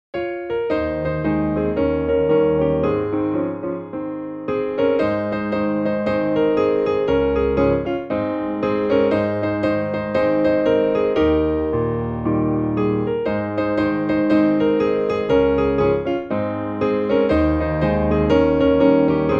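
Traditional Piano Hymns When The Roll Is Called Up Yonder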